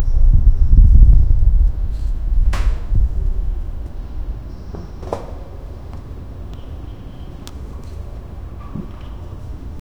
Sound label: ambient tavern sounds